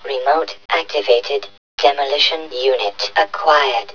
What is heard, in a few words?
detpack; svencoop